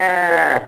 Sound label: pain; DOOM